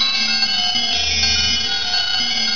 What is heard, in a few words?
Sound bell